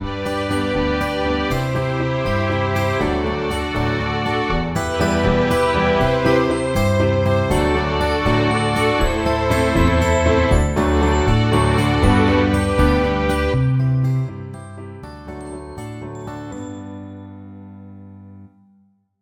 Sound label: fresh page countdown, music for youtube shorts